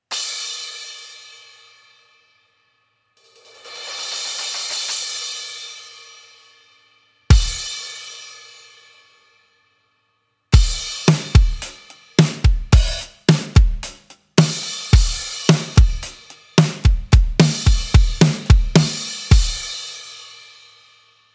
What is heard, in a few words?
16 Crash, cymbal; sabian; frx1606; 16frx